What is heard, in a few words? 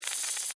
Insect Hiss Attack, Spray, Bug; Animal; Insect Voice; BlastWave